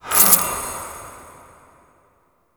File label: Boon Reroll, UI; Duviri